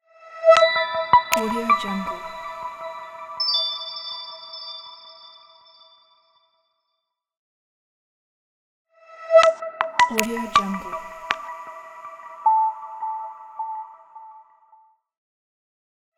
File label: tech logo reveal